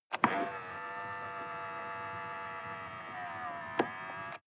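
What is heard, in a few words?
sports car interior side mirror adjust; verkehr transport; autos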